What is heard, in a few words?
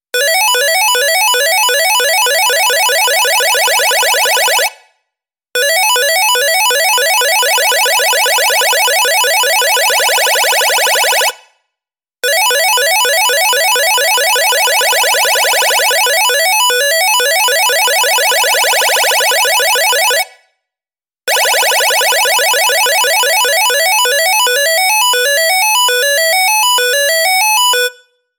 Retro Game FX